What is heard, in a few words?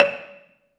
BELA2 C#4, BELAFON, Asian Traditions; USB Soundscan vol.60; AKAI